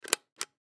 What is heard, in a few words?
Guitar Amplifier, Guitar Amplifier Foot Switch Channel Selector Press Down; Miscellaneous Electronic; Musical; Blastwave